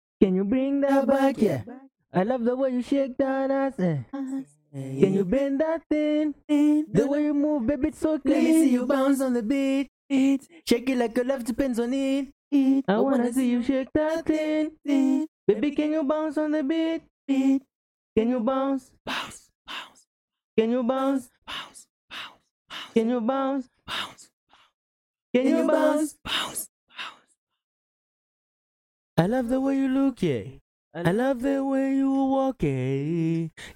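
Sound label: reggaeton 100 C sharp minor, acapella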